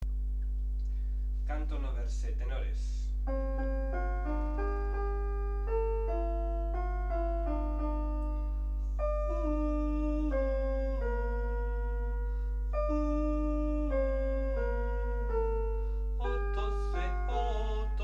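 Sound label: tenor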